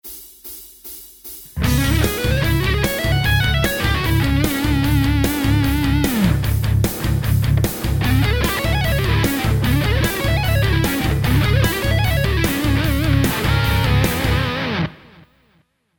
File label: Lick